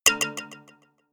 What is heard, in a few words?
notification kalimba delay fifth